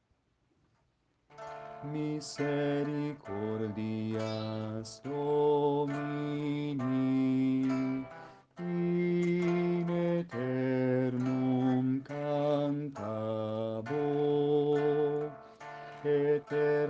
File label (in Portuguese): Misericordias Domini baixo